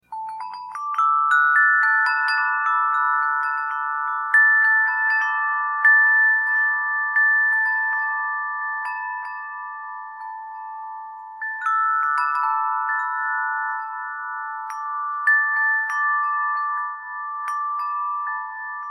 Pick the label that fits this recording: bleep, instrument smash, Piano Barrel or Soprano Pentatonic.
Soprano Pentatonic